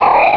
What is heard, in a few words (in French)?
Cri